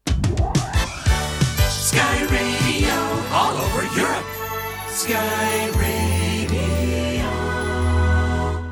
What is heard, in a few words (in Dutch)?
omroep jingles